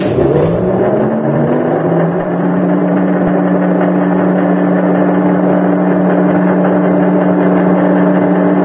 crane extend loop; vehicles; Garry's Mod; hl2

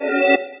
friend online; Left 4 Dead 2

